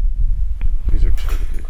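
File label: EVP glass breaking cemetery; ewald cemetary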